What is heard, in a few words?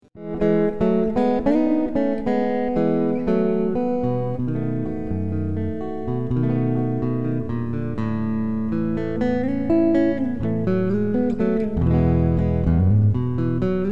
Pop Rock on the electroCoustic